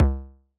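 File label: Bass Drum; Yamaha DJX-2B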